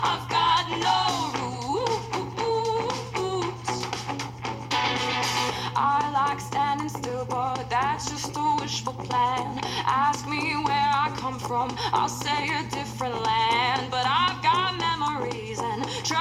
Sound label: no roots vocal